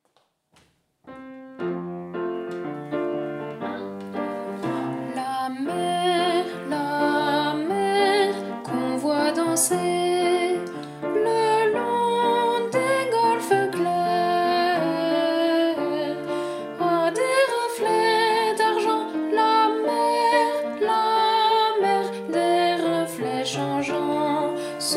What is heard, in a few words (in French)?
hommes